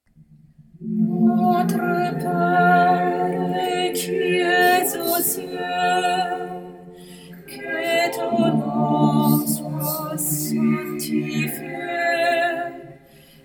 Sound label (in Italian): contralti